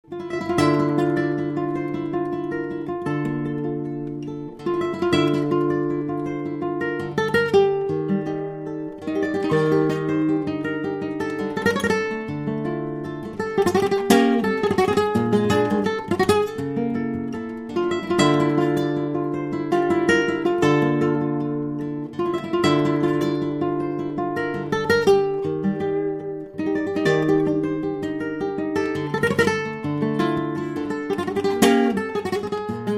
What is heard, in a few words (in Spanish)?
Guitarra